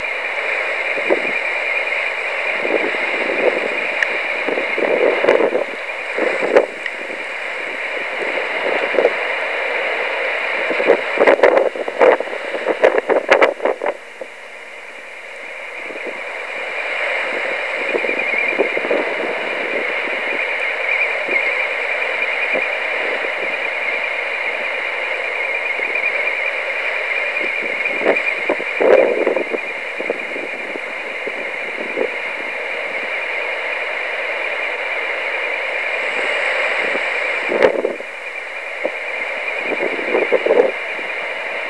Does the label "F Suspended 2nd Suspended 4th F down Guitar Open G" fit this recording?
No